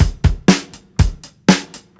8TH BEAT, breaks, drums; Basement drums vol 2

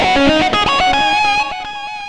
xtra lead

